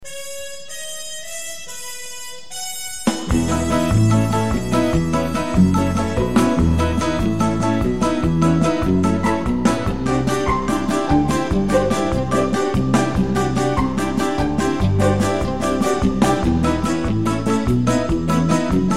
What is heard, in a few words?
Traffic Jingle Sounder; WCCO AM